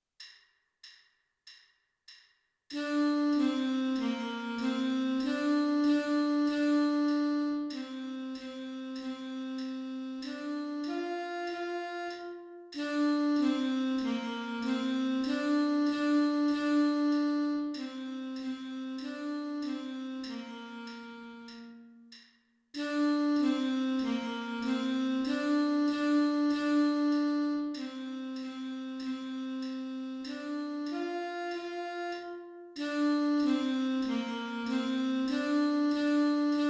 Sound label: alto sax